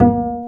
STR Cb Pizzicato; Roland L-CD702

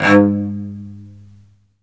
SOLO CE, Strings; Synclavier; ILIO

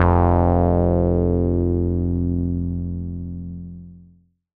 BAS F1, Yamaha CS40M synth bass

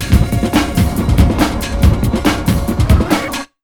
RUN 140, Big Beat Drumloops; USB Soundscan vol.25; AKAI

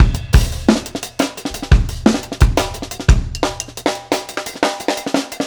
Bon Bon Kit 4 Bar, Drum n Bass Beats; Beats and Percussion; KJ Sawka; Drum n Bass & Beyond

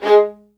MED ATT G#, soft violins; Q Up Arts; Denny Jaeger Master Violin Library

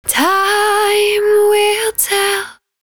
110bpm Ab, Velvet Vocal; Zero-G

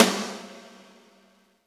SNR, Roland, Reverbs; Club-50 - Foundations Roland

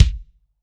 KICK, MG KICK

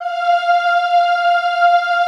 EHH F4, Classical Choir; Best Service

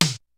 kicks snare, kid; Kid Nepro; Elite; KICKS SNARES

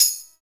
PER TA, Percussion Tambourine

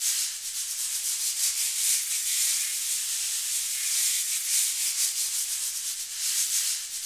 shaker, Africa; SHAKER FX; Spectrasonics; Heart of Africa 1

